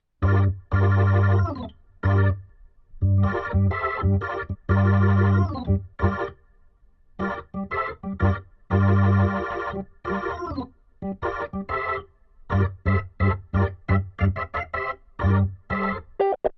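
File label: organ, Hybrid neural guitar overdrive; honeybee; Morphdrive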